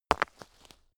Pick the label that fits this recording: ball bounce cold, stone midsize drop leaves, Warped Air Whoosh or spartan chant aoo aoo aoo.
stone midsize drop leaves